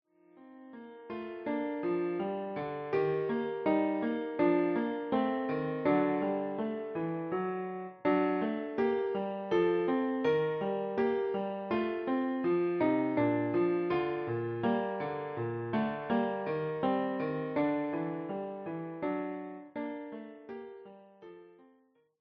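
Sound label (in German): Ich steh an deiner Krippe hier, Klavier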